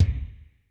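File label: ROOM KICK, ROOM KITS; Crypton - DrumBase5000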